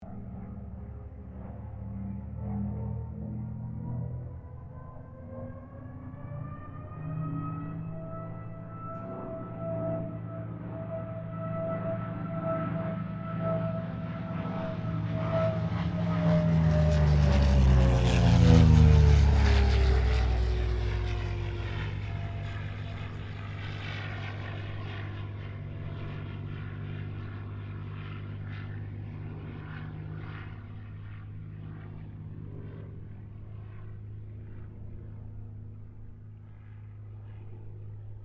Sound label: Kittyhawk Boomerang pass, Airplane; Mixed aircraft squadrons; Vehicles; Aircraft Collection